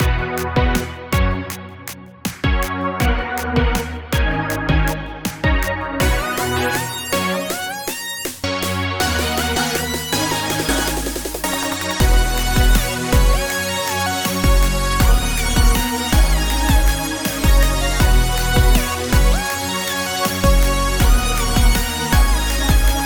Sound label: Future Bass